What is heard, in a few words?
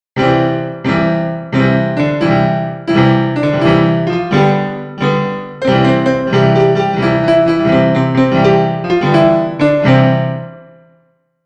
Homophonic Row